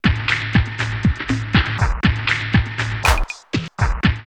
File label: drumloops Techno House 120; Making Sloppy; X-Static Goldmine